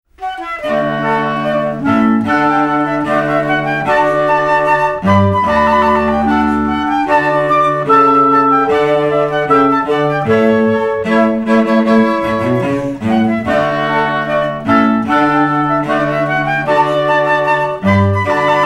Classical Trio

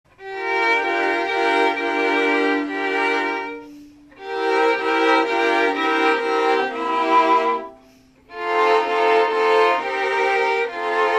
string quartet; Classern Quartet

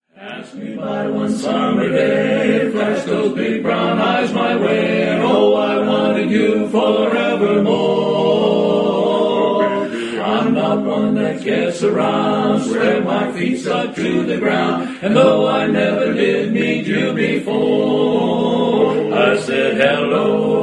Barbershop Quartet

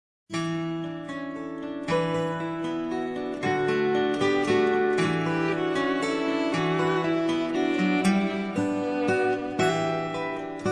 acoustic duo; scarborough fair

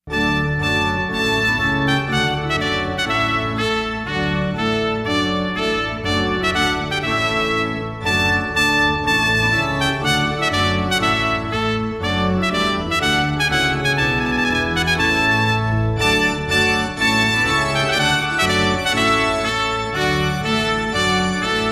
Organ Trumpet

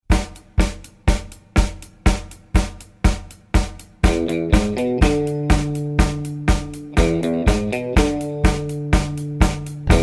country

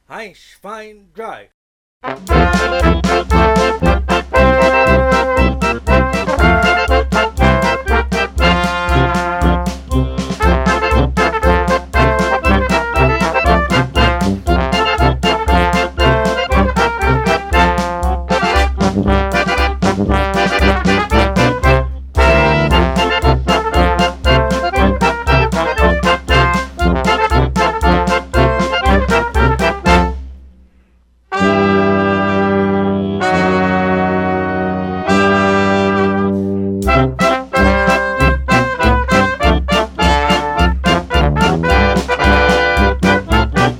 Munchner Oktoberfest, German Band

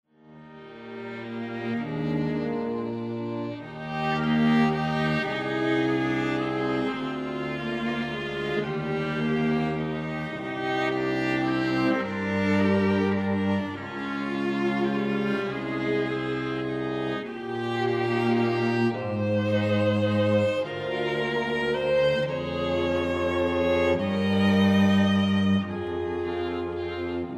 string quartet

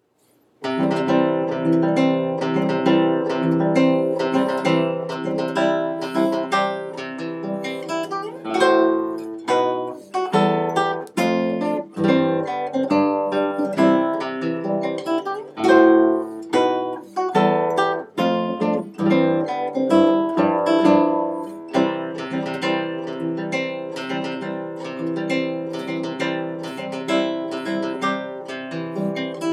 Guitar Player; Fm Productions LLC

